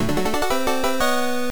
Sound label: Power Up; Exed Exes; arcade